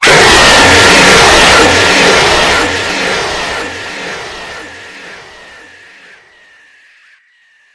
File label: reset jumpscare sound; nights shift at samuels